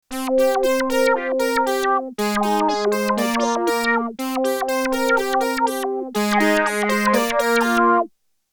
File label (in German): Marion Systems MSR2 Filter Env mit Hold